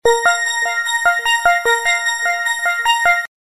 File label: Get Up